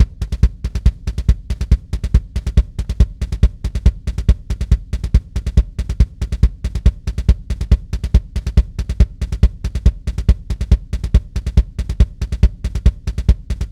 Alien Kick G; Bust n Rush

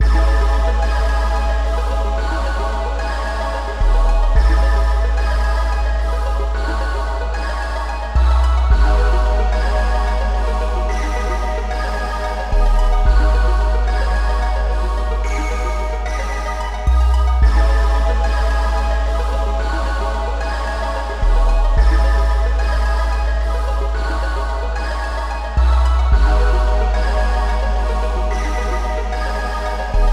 All Stage Clear; sanvein psx

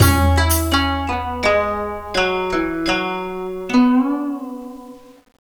Defeat; lego ninjago the videogame lego battles 2011 nintendo ds gamerip

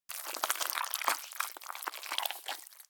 Gore One Shot

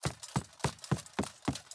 zombie laser miner run; Hollow Knight

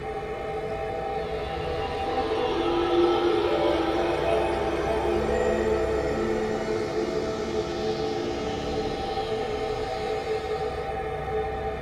anomaly loop

